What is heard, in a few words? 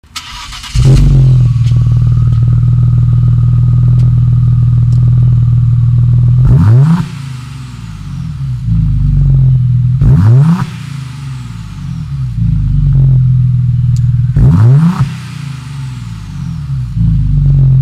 outside revs, exhaust